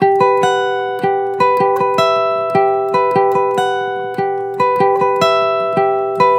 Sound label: Acoustic Melody Loop 150 BPM G Maj; Cymatics